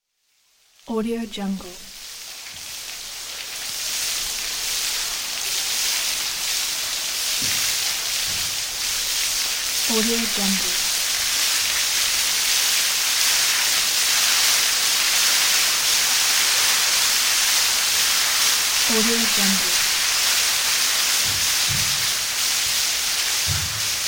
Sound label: large waterwheel turning and droping water